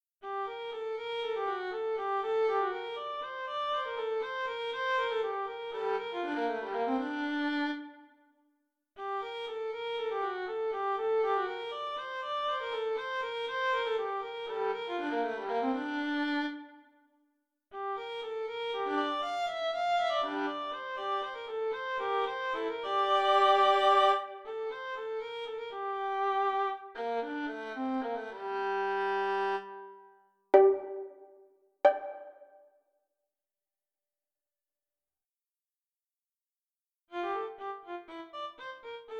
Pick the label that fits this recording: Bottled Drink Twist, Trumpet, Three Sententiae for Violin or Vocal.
Three Sententiae for Violin